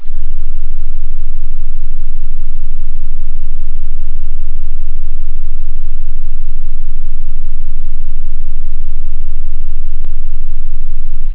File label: modul, synth bass